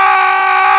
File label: Attack Kamikaze